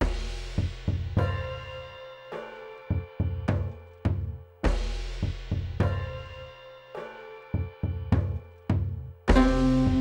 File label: Asian Walk Dub